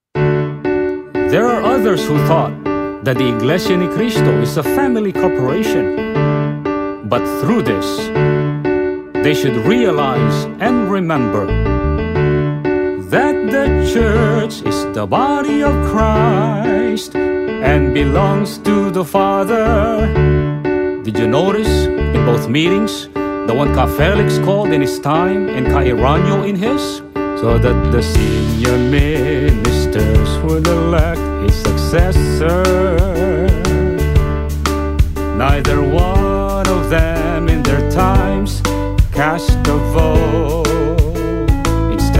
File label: Dialogue Song; Act 3 - Scene 11c